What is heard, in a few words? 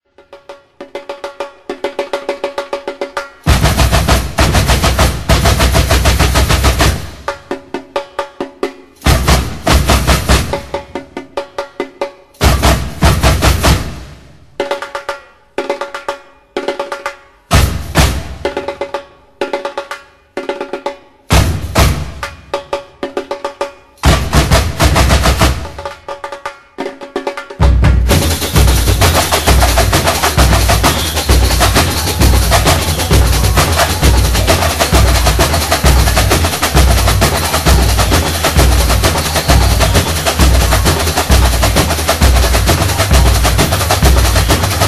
SAMBA